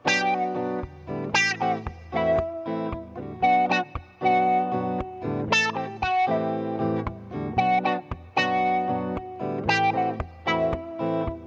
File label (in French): Guitare Wah